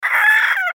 sound ouch little; Monster Arena

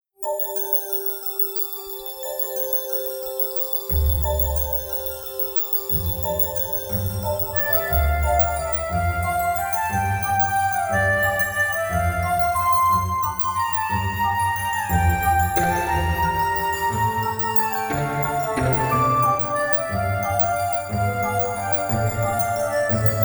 Waltz; Cutie Saurs; Mr. Driller

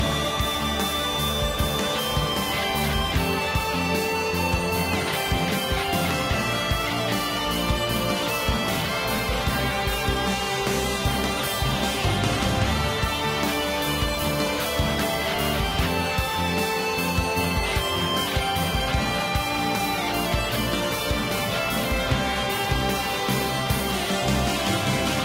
BGM Dungeon Hard

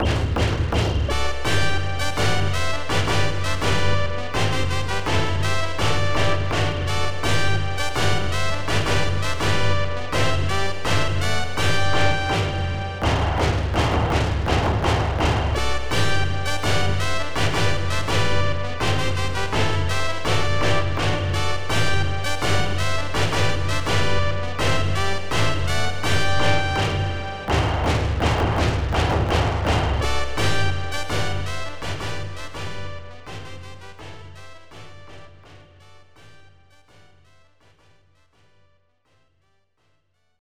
Hitting March

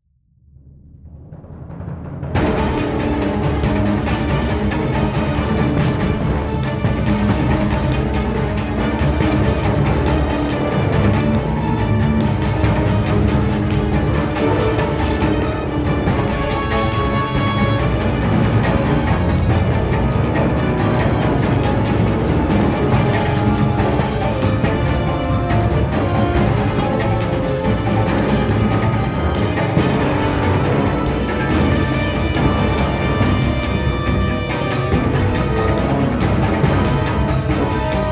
Loop Fight; Game of Thrones: A Telltale Games Series

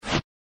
SFX Interface Change

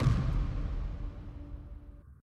Dramatic Hit

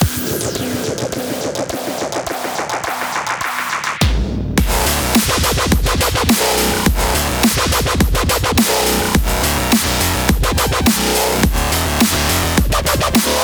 Loop Battle

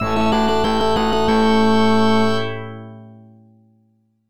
Round IV Clear; Tecmo Knight; arcade

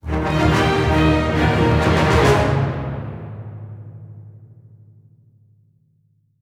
Revive Music; Zombie

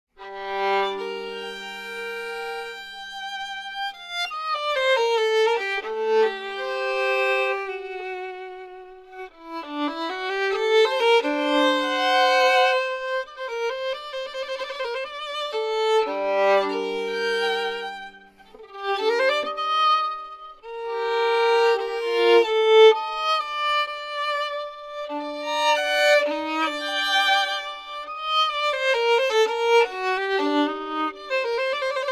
Violin; Assassin's Creed Syndicate

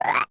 Animals Croak; Scratch game maker software soundtrack

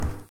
walk barefoot

